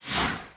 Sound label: melee rip; terrorblade